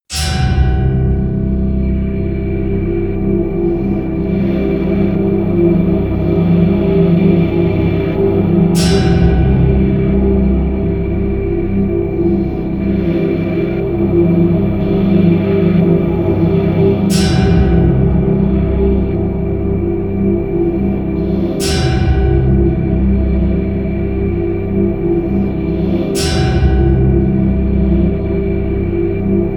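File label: mysterious anvil, pads; flaechen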